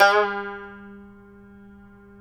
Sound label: TAR; Roland - LA Composer vol. 3